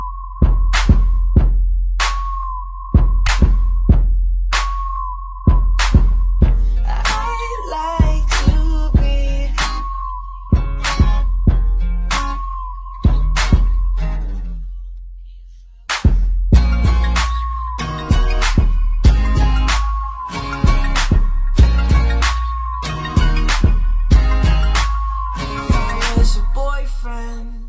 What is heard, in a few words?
ring, dance